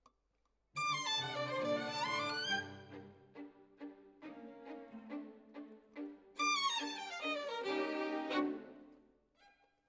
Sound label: Violin Solo